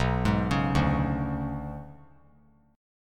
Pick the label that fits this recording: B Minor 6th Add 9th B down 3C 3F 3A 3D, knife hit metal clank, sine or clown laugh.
B Minor 6th Add 9th B down 3C 3F 3A 3D